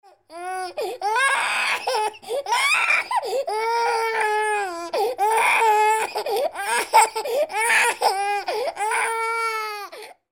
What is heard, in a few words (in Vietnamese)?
Tieng tre con khoc